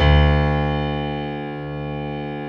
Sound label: piano stereo D 1; AKAI S6000 CD-ROM - Volume 1